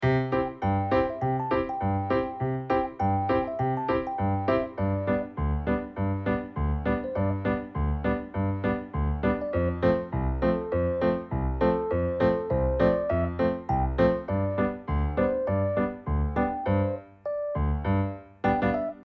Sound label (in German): Jahrmarkt Loop